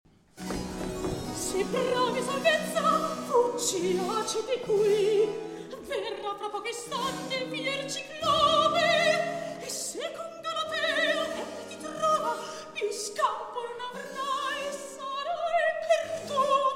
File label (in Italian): Recitativo; Se Brami Salvezza; Polifemo